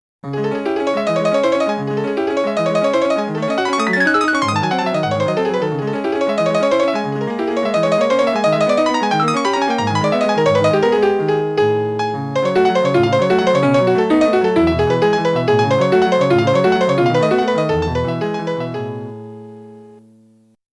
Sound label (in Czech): melodie